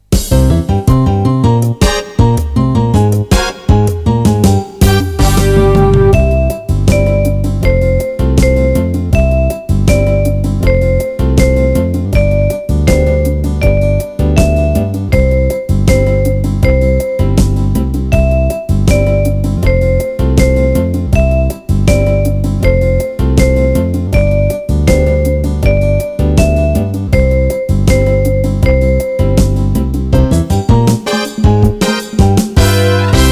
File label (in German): Glockenspiel, Melodie